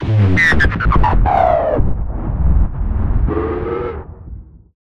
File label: Downer; Effective Trailer Downers